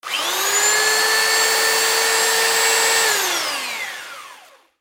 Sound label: Belt Sander, Belt Sander 3 Inch By 18 Inch Turn On Run Turn Off; Sanders; Industry; Blastwave